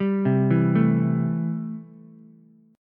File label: C 6th C down Ukulele; C6 arpeggiated